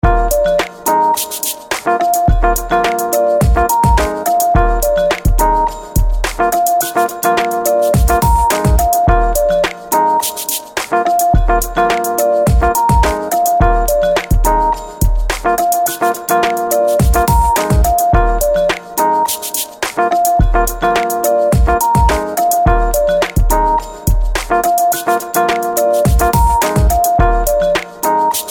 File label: cyber; 散策：サイバー13; maoudamashii